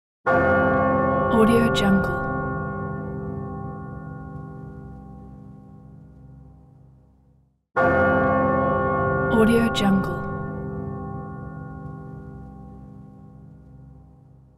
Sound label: church bells